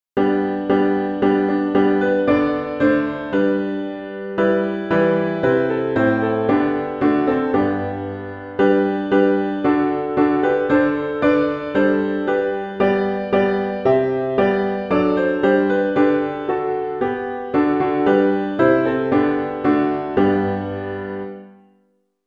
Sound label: Piano all parts; Psalm113 (Rus112)